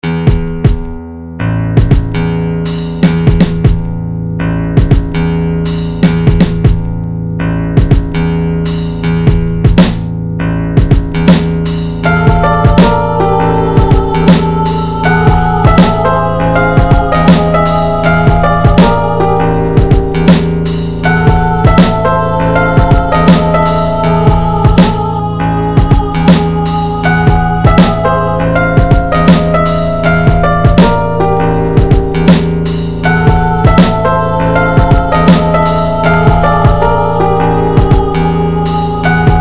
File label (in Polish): lewy beat